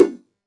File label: whoosh fast, transition